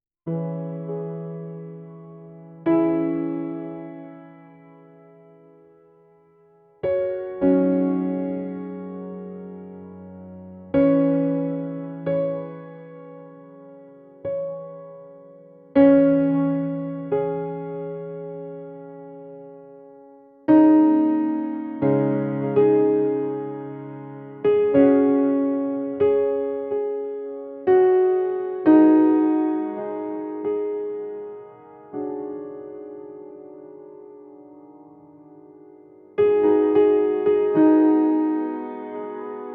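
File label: simple piano played slowly; instrument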